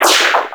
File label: Laser Echo